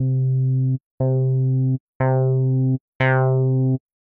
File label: filter modulation